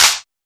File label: Clap, Techno; Techno Sounds 2024